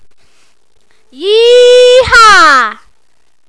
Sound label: yeehaa